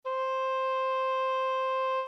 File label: Oboe; Real Samples